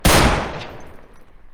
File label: five seven 57 pistol